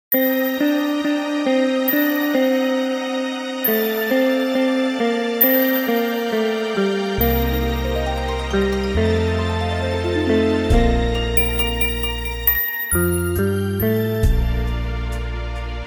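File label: minus B major alto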